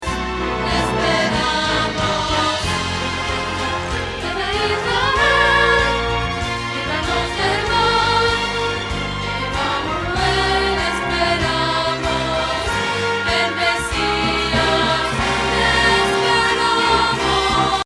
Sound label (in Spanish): Contralto